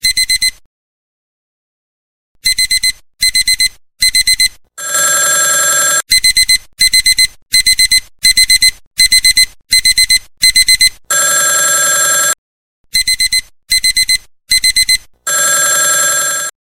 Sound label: Clock Alarm